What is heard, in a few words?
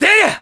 Sword Spin; Link's sword-spin attack